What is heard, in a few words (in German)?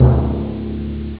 pauke; Amiga Soundtracker Sample Packs